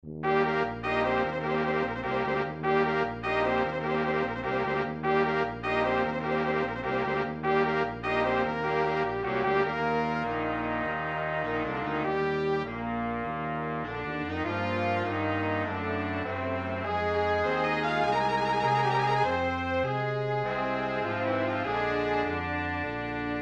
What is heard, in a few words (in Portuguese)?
midi arrangement